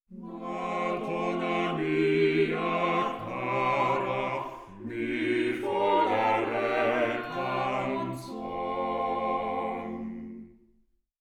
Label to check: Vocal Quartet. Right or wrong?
Right